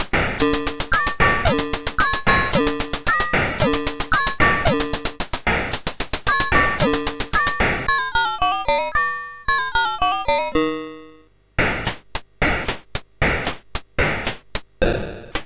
Drone, MOS6581R4

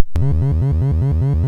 Die Alien Slime, MOS6581R2

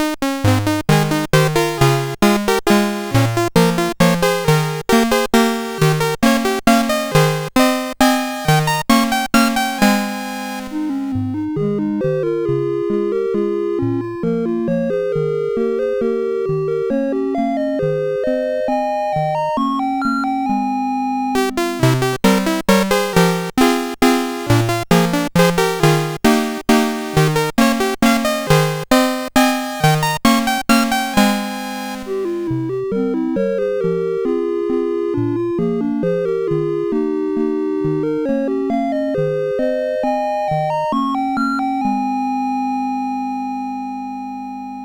sid, CSG8580R5; Color Scrabble

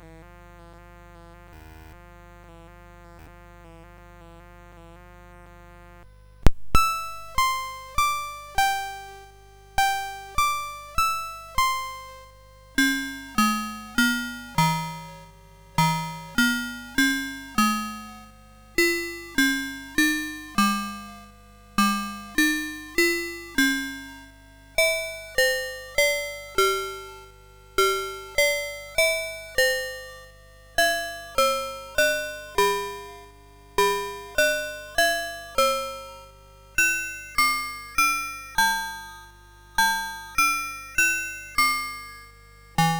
Ring Modulation, CSG8580R5; Demonstration

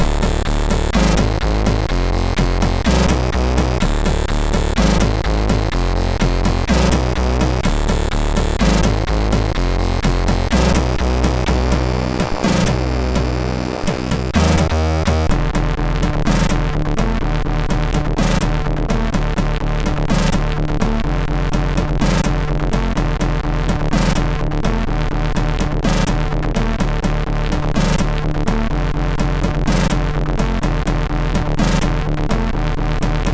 Geister, MOS6581R3

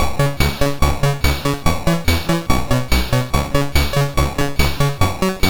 Commodore 64 Music, MOS6581R2

